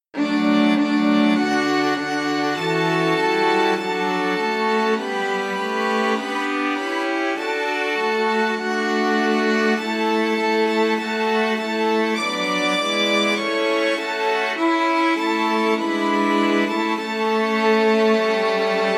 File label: String Quartet Arrangement